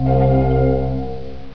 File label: items